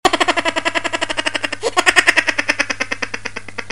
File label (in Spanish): risa